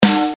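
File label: comp snare; synth basic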